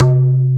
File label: tabla C3